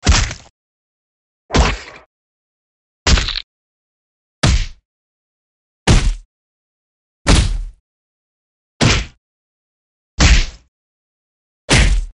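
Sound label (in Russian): boxing punches in boxing gloves to the face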